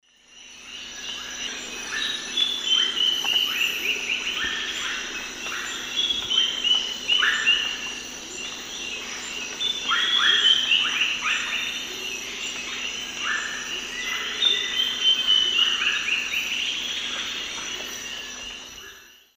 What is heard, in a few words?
Sclerurus caudacutus, song; S. America; Eastern Lowlands